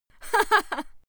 female laugh short

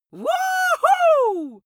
wuhu excitement male

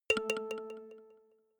notification kalimba delay

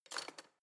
knife wood drawer place

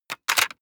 plastic device handling clack

